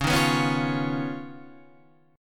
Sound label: D sharp 7th Suspended 2nd Sharp 5th